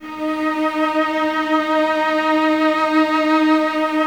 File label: violas D#4, String Ensembles; Miroslav Vitous; VLS 1+2